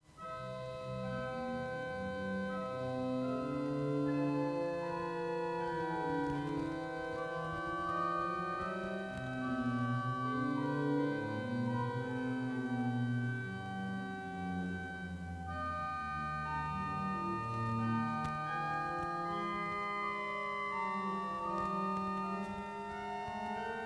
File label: salicional 8 II man